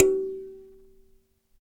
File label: Dyna Sansa; Roland LCDP11 Africa VOL-1; Sansas